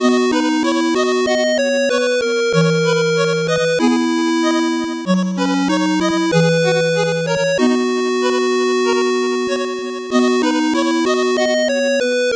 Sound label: 8bit bgm normal